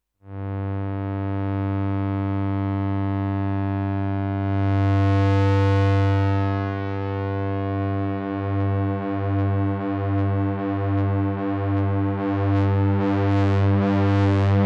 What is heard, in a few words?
Moog Muse Overdrive